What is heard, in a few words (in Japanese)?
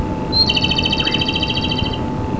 komadori; tasiro